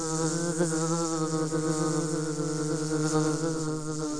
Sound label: Bug Buzz